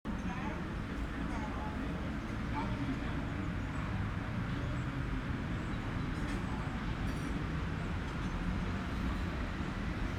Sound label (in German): Spielplatz unerwartet